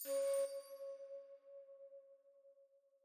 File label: Alchemy Flute Combo